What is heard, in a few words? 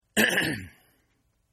Cough Clear Throat Male; Sickness Sounds; Sound Effects:Human; Partners In Rhyme